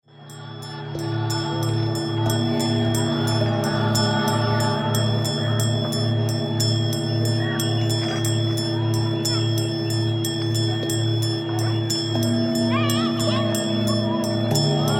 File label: Nepali Gompa